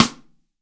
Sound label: BRASS RIM, RIM SHOT